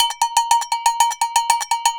AGOGO, SAMBA; World Grooves; DRUMS; Best Service ProSamples vol.14; AKAI

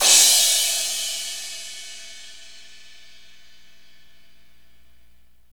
CYM, Drumscapes Roland, Power Ballad

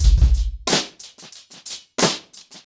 90BPM, acoustic drums; The Roots Drumloops

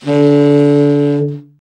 TENOR SAX; Tenor Sax 3

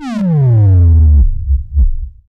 FX SWEEP; SYNTH FX; SYNTH SWEEPS

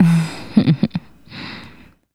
Female Laugh, Hip-Hop and RnB Vocals

